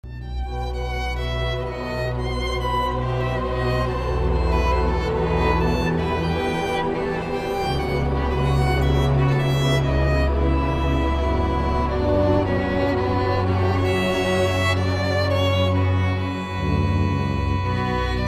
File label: violin partita